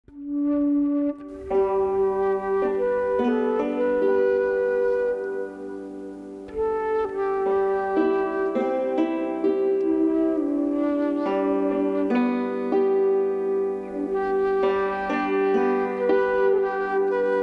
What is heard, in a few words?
Amazing Grace flute hammered dulcimer